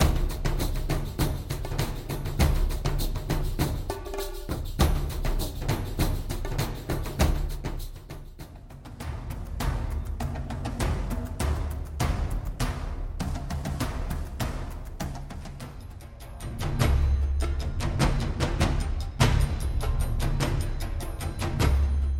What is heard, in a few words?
Deep Percussion Beds; cinesamples